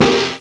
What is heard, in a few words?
TECHNO